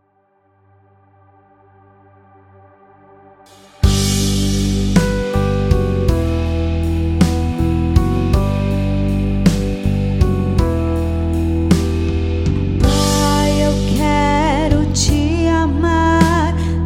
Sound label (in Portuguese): Fem Play Back Com Voz